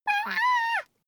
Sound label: child play